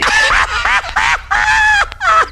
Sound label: laugh